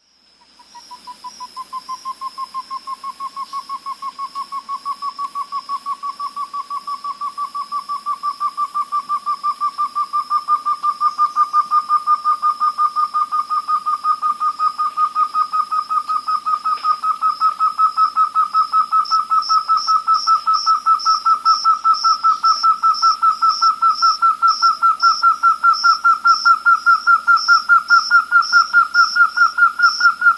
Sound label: Schwartz's Antthrush; S. America